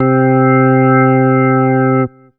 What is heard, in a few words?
Ensoniq SD-1 Rock Organ C5